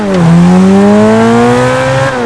second, vehicles; gtav

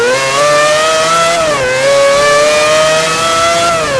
second, vehicles; gmod; tdmcars; furai

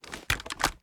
reload drum end; gmod

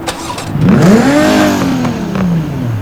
startup, vehicles; 2000 gtr sh

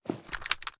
reload waffle rotate; reloads; gmod; iw8

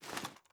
ar xmag reload fast arm, weapons; gmod; viper

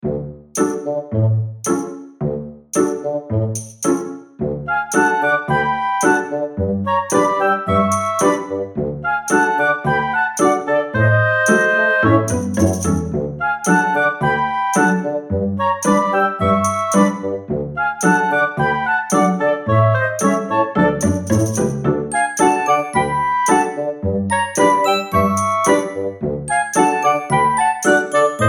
bgm

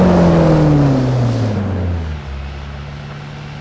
throttle off, vehicles

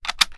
kilo98 reload empty fast load; gmod; viper

